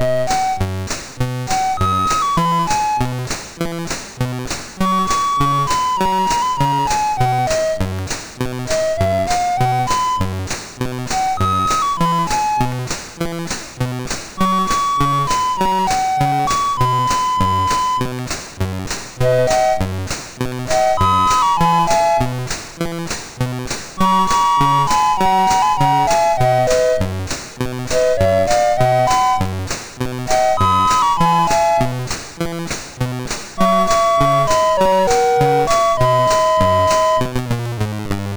Synth Sample III, sid MOS6581R2

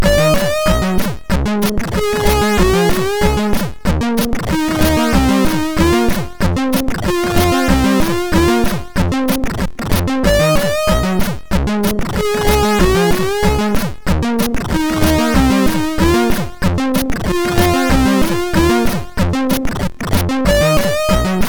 Old Music, MOS6581R2

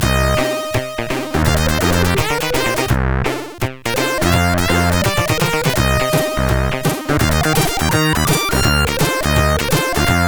Short Jazz

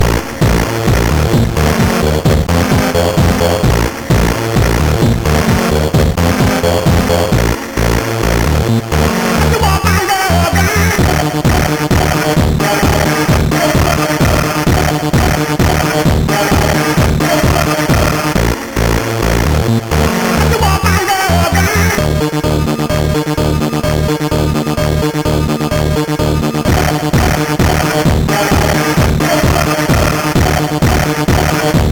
Winning Tune, CSG8580R5

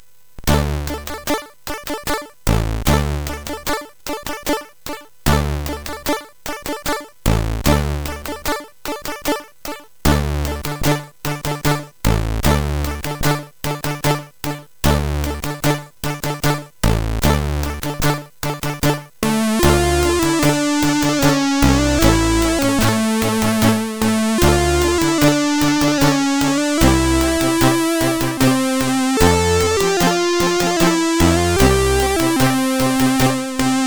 Conquest, CSG8580R5; hvsc

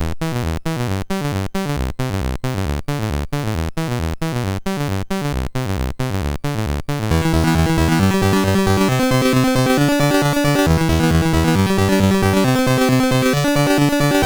Metal Disco, MOS6581R4; sid; 4wd-soft

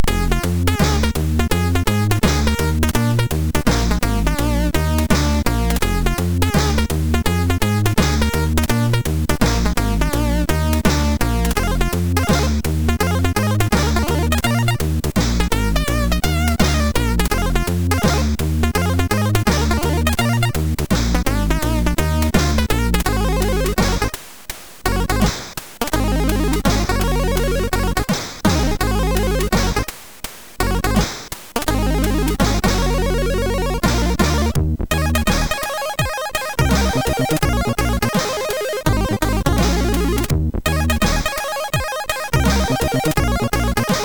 Jazz Solo, MOS6581R4

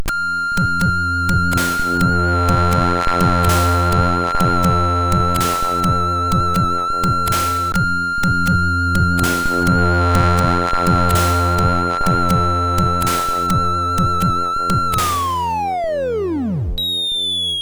MOS6581R4, sid; Kartofel

